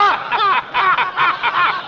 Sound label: laughter evil group; ooh ahh